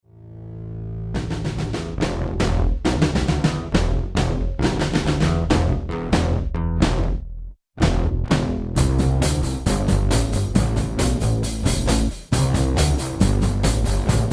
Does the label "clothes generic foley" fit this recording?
No